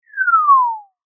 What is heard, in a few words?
Descend Whistle, Cartoon; Cartoon Sound Design; BlastWave